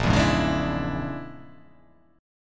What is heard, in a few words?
A sharp 7th Sharp 9th Flat 5th A sharp down; A#7#9b5 strummed; JGuitar